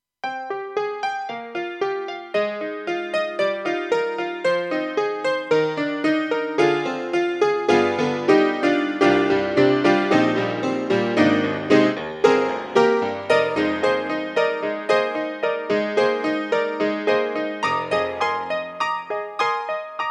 AKAI SG01p DETUNE GRAND

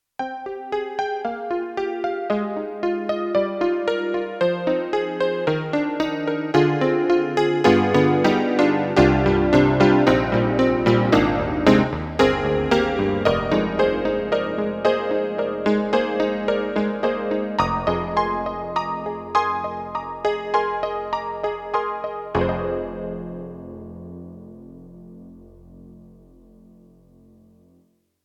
Det. CP80; YAMAHA MDP-5 XG

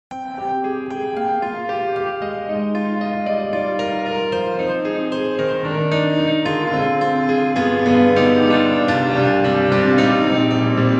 Grand Rhapsody Piano Reverse Reverb; Waves

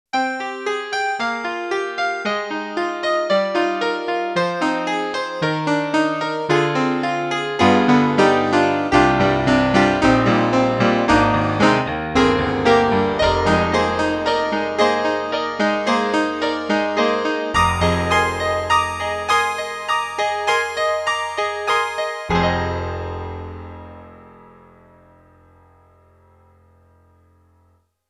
Proteus1 Pop Rock FM style Piano

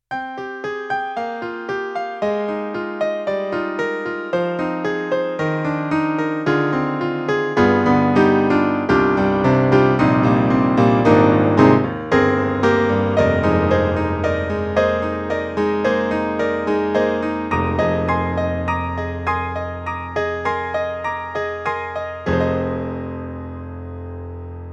KORG P3 Piano2

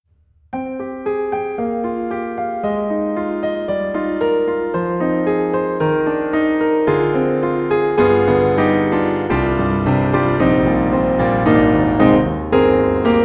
Pianoteq D4 Jazz Recording BA; MODARTT S.A.S.

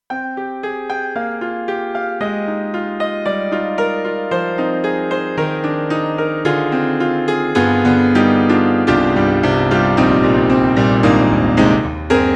Piano; Roland; SC-8850; Piano 1

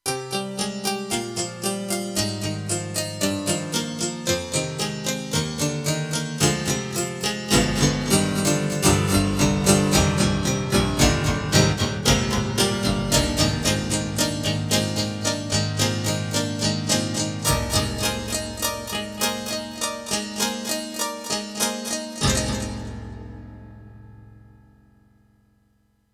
KORG TR-Rack Piano; Lock the Piano!

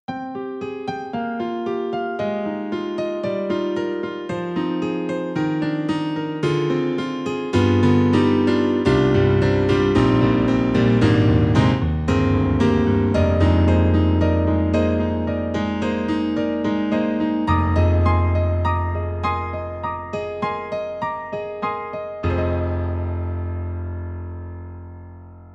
KORG KRONOS 2 2 Way Stereo Grand

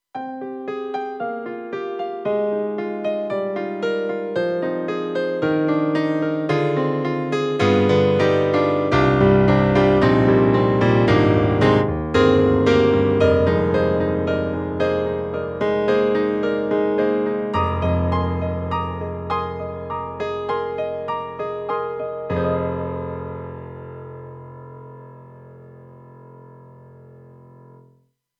KORG M3 XPanded Dynamic GrandPiano 2; M3 Version 1 Wave

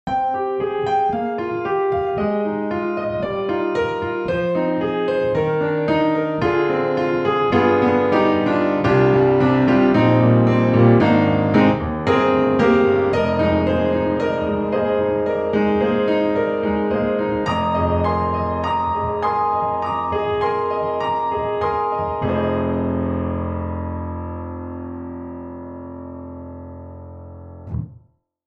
Grand Rhapsody Piano Room for More; Waves

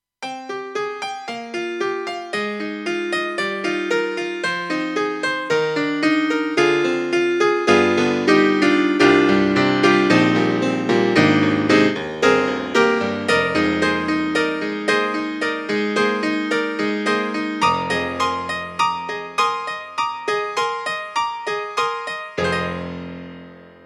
AKAI SG01p METAL PIANO

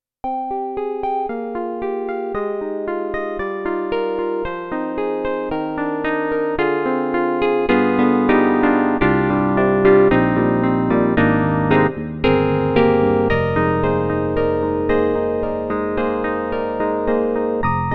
YAMAHA MU90 60's Electric Piano 1

